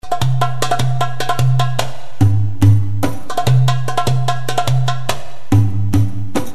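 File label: African drums; afrikanskie barabany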